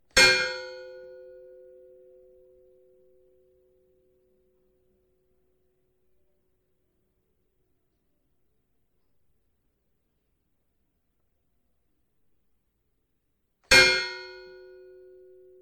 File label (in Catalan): campana